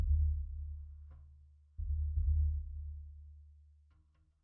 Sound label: sub